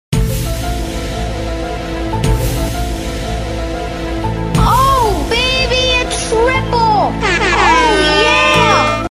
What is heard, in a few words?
follower alert